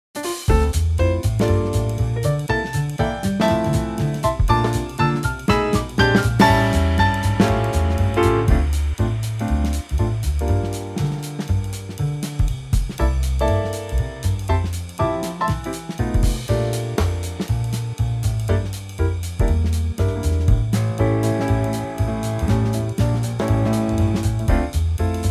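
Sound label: swing Dm, Backing Tracks for Male Vocals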